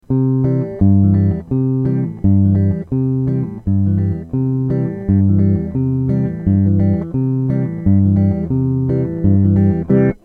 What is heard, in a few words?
alt bass